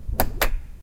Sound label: door open